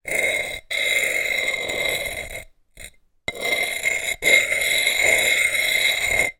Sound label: foley sharp object scrape over surface on pottery